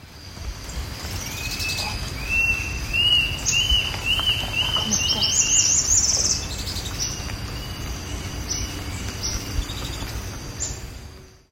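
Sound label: Mackenziaena, bird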